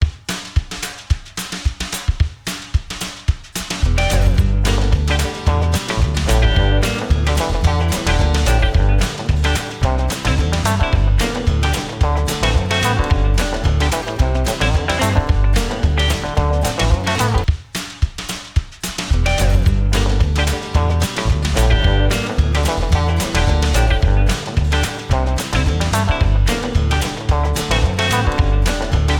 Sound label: Swing Dancing Loop